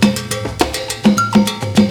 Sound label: LATIN LOOP, BREAKBEATS; Crypton - DrumBase5000; BREAKBEATS#2